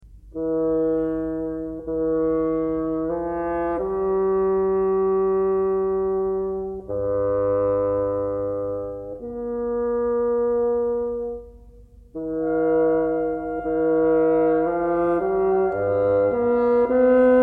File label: Bassoon solo, oboe, clarinet and bassoon